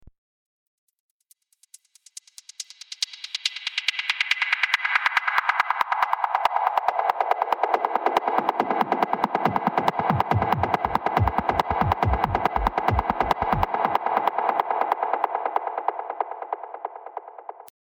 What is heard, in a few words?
Pink Noise Effix Delayed; Techno